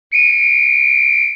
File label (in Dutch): Fluit, Treinbaan